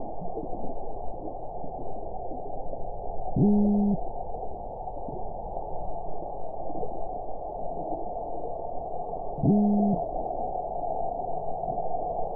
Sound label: toadfish and boat sounds; soniferous